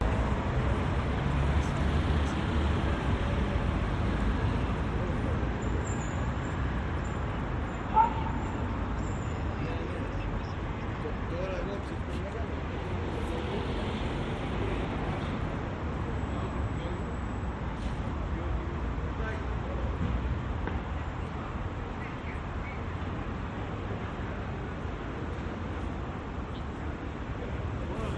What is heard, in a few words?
Spain Alicante Night Plaza De La Puerta De San Francisco Binaural; Loop Seamlessly; PMSFX